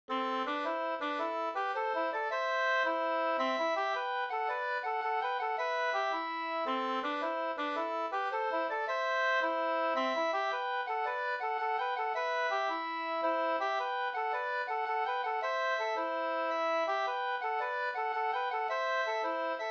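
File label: polska, two-part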